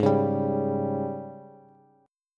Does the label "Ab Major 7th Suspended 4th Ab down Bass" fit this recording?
Yes